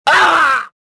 pain

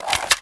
Hand Gun Equip

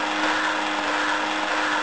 steam ROLL; The Elder Scrolls III Morrowind GOTY; XBOX

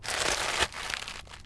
Herb chew, Dino

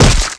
head smack, skater; Tony Hawk's Project 8; XBOX

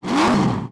rev; Cars; XBOX; MG

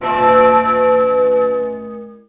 death

